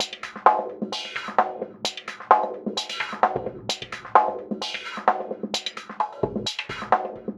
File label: Beat, Drums, Eclectic Electronica